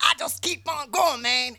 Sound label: VOC